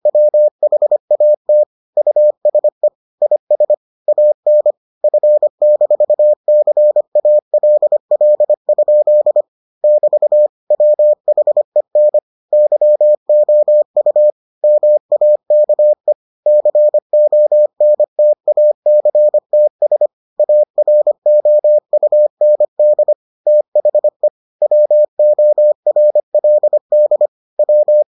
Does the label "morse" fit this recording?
Yes